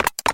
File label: pswitch; Los Acantilados Rojos; smbx38a; The Great SMBX 38A Contest II